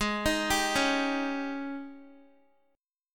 Ab Major 7th Suspended 4th Ab Guitar 4E,4A,5D,5G,5C,6F; arpeggiated; x,11,13,12,13,x; down